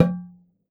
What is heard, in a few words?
oxaca, percussion; ILIO